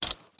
armor

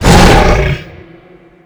shout short

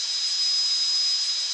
turbo; geareffectsounds

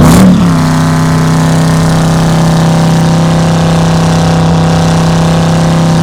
charger shift down; charger69; master chris

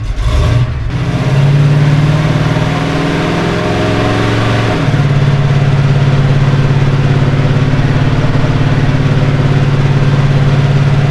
first rev loop, limousine; vehicles; sligwolf

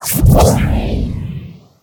charge cast

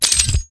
ammo pickup; doom gauss

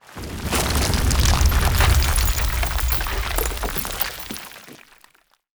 frag debris; exp frag

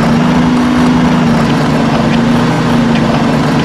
high, sherman

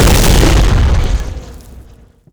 explode; weapons

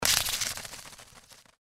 wing turn; npc; cazadore

